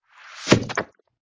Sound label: Wet Squoosh Pop